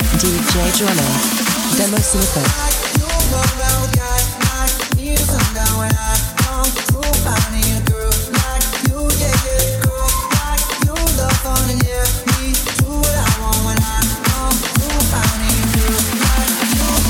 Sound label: Am 122bpm